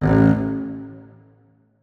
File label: notes, keyboard strings; Hideout Furniture